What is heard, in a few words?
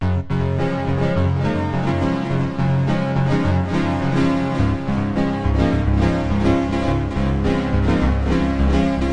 synthscape; remixable collections; 105 Dibble Dobble